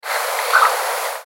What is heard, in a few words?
Northern Flicker, flying warble call; ORDER PICIFORMES; A gutteral flutter call, sounding like wings beating (carries poorly); given from perch or from flight